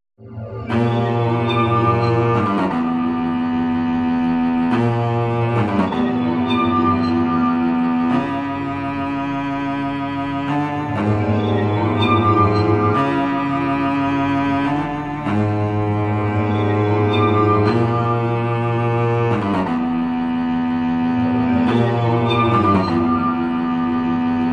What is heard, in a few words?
cello waterphone